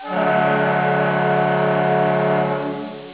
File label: Airhorn